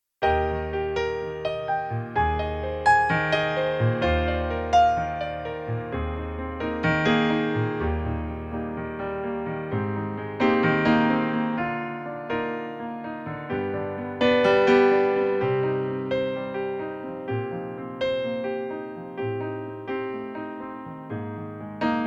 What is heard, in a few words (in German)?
Pianoplayback Eb-Dur; Originaltonart